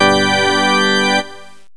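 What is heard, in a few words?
organ chord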